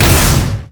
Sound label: crit attack; lucian skin01